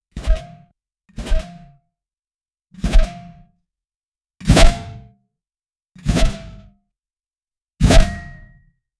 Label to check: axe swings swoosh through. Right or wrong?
Right